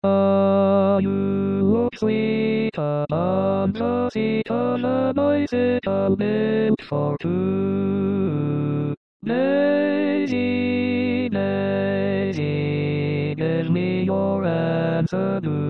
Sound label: lead